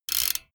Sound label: bmx bike crank freewheel chopped short